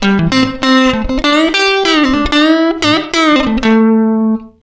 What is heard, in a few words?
guitar without echo